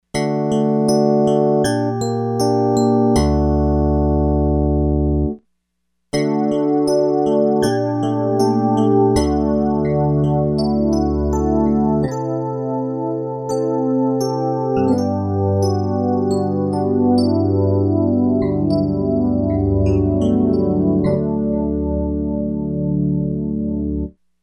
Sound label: STEREO CHORUS